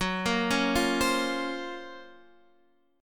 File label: Gb Major Sharp 11th Gb, guitar